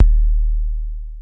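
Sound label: bass drum, Korg ER-1 drum machine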